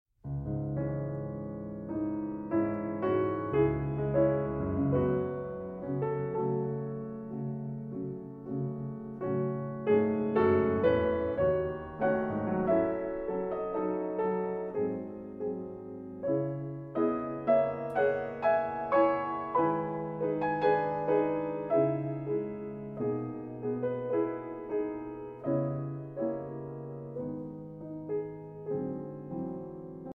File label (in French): etude posthume en do diese mineur scriabine; classique cinema